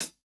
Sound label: hihat, house